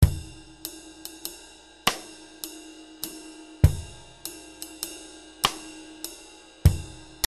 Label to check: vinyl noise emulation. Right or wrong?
Wrong